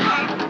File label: shot; player